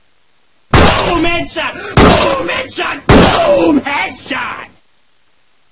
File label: headshot